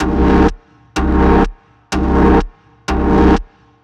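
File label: Music Loop 125 Cm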